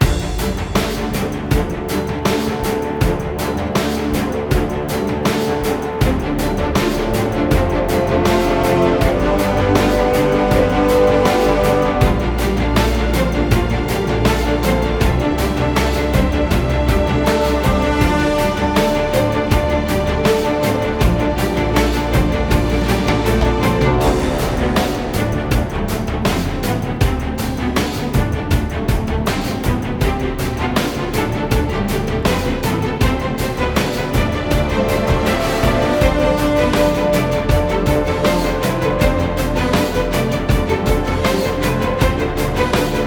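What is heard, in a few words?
Music Orchestral, Orchestral Pre Race 80bpm; Forza Motorsport 6 Apex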